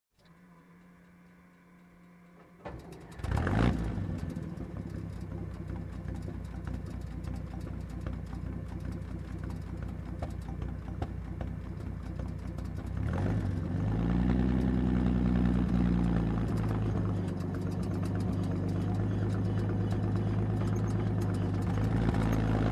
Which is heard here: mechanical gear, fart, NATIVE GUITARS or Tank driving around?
Tank driving around